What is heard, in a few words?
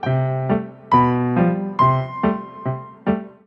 Doodle Mood, Sting 1